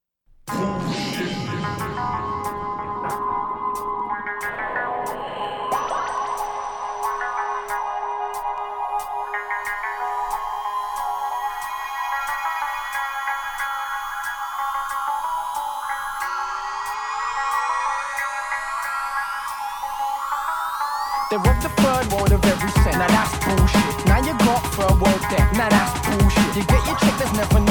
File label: JUNGLE DRUM AND BASS